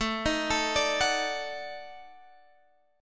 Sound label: Eb Minor Major 7th Flat 5th